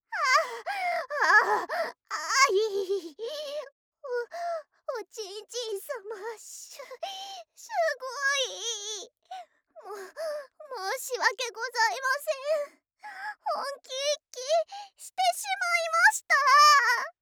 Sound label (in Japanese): はぁっ はぁっ あひぃ…